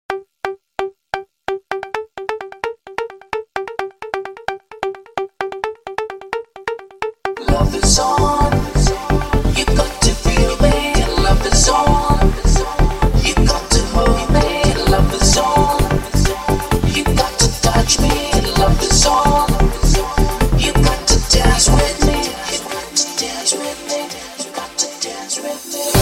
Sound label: smooth ringing